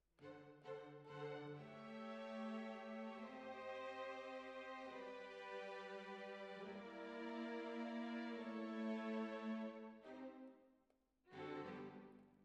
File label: Clarinet